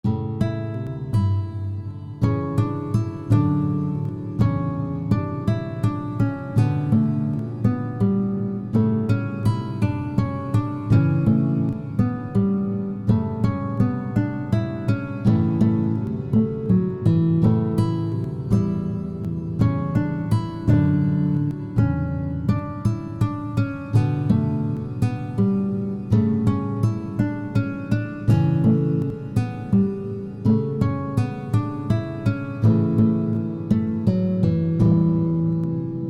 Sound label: guitar